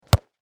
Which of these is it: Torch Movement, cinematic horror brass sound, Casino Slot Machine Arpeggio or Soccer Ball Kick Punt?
Soccer Ball Kick Punt